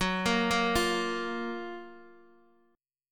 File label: F sharp Major 7th, Guitar, DADGAD